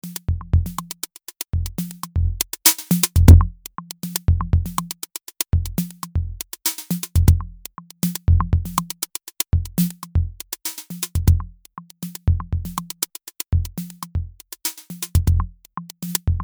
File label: minibeat